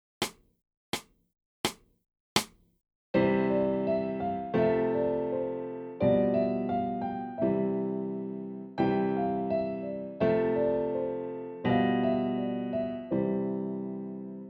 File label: the dominant seventh